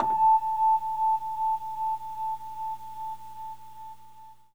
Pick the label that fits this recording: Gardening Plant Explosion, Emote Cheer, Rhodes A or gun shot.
Rhodes A